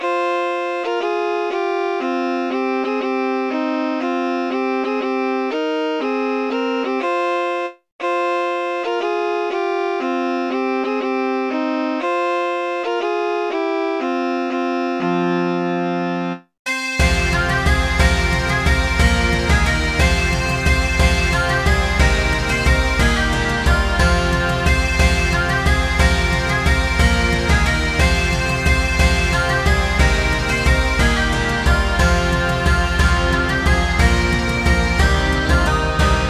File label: medieval dance